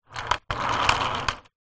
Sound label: household vacuum cleaner suction nozzle on wheels roll on tiled floor